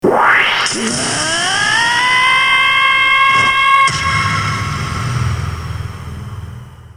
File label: yell